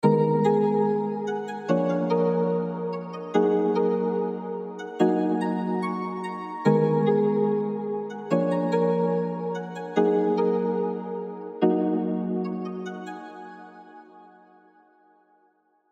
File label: Pluck; Flouresent; trap vst - Io